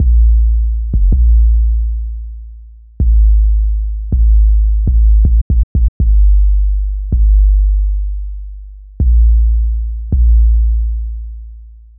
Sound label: 808 Loop